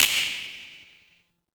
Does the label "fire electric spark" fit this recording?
Yes